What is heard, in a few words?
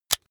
razor rickety fold up hard